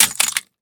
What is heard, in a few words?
pistol drop glass; STALKER; IWP